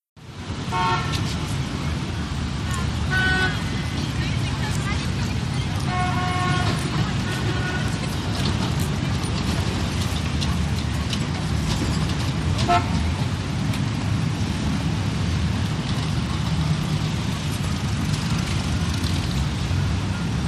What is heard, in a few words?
NYC General Traffic, big city